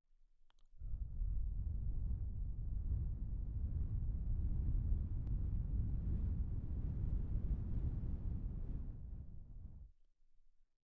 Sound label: wind